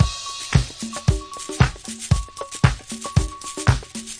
disco gave me the clap, 114 bpm

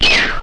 fake laser

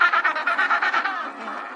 136 penguin mating beat, drum loops and breaks

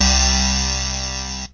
chord, Synth

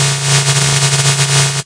noise computer

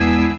urku hit, Organs

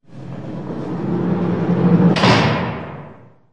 jail door slides shut; Sound FX Real Life

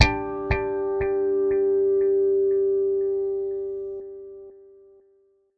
harmonic echo bass; Basses

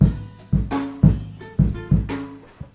087 lofi drums n guitar

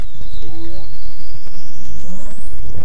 dirty phase noise; Electro and Synthetic

